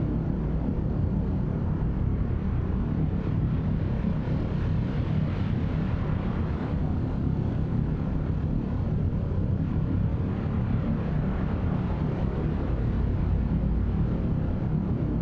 ship construction ship idle

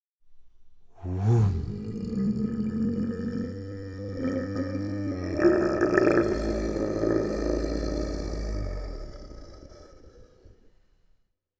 kaiju adult ship entity death; biogenesis

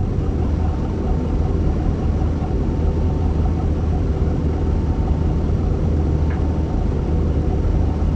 ambient space pirate large ship; Stellaris

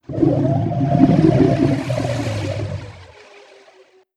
space amoeba; Stellaris

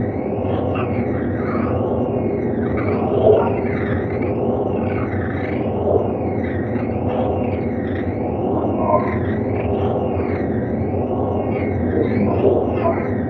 ambient research station signals; Stellaris

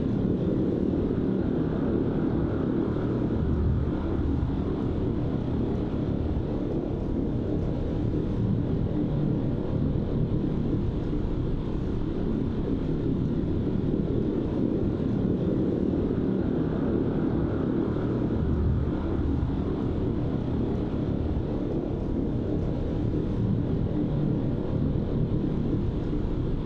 ship titan idle; Stellaris; machineage

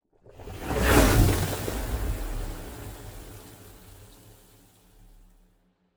ship destroyer move; Stellaris; machineage; ships

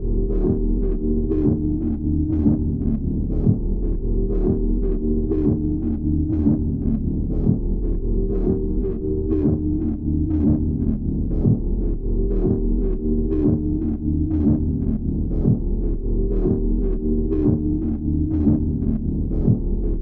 matter decompressor pulse; Stellaris; megacorp

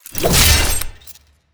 trap spikes; ancient relics

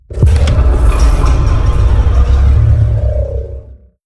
spy orb build start; Stellaris; utopia

